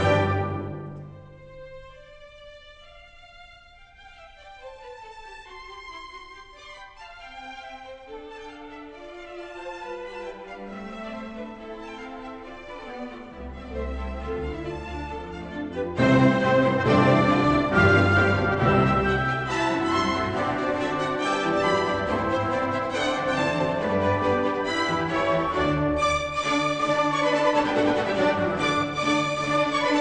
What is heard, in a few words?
classical